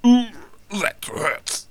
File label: spearman die; megaglest